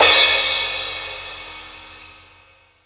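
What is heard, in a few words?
Torn Zildjian One-Shot, cymbal; KJ Sawka; Drum n Bass & Beyond; Drum Tool Box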